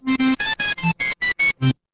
retro game power up

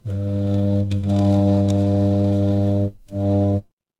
fire electric arc

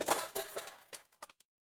impact crash

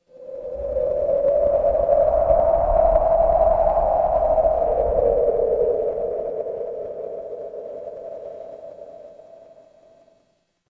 horror wind whisper

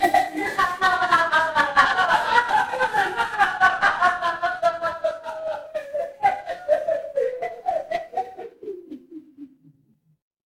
crowd laughter

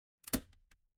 office stapler

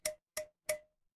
glass glass tap

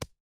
impact punch

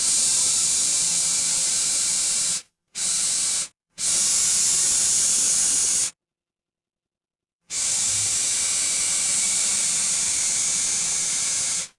ambience room tone

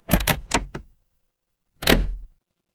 door open

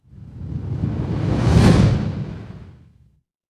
fire electric whoosh flame

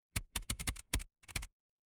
office keyboard

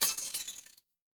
glass shatter

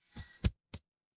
feedback achievement

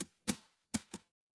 feedback achievement

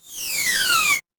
transition sweep

